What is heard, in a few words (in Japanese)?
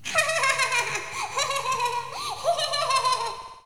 warai hyahaha; 女; 笑い11 あははは （子供）; いたずら子供霊の高笑い